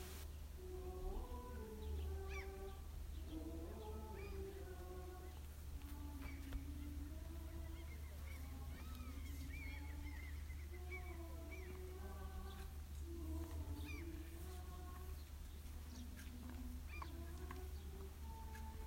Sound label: Outside Balestrand Church, Norway